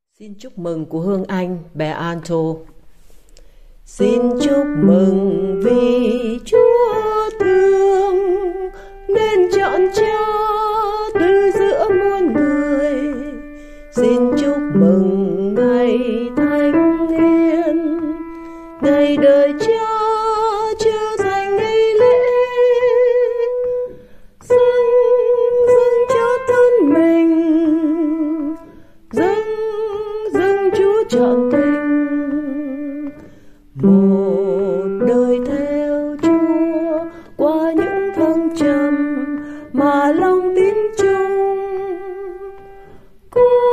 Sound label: Alto; ca doan teresa